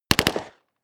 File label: smg uzi 45 distant